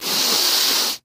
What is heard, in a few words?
snif, Sniffing; Interactions